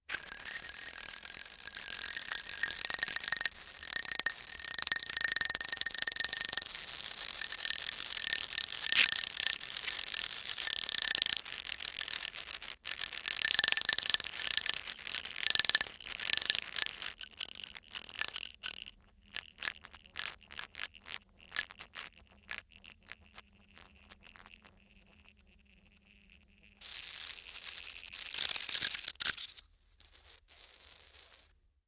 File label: dry ice coins bouncing on a block of dry ice; sonniss gdc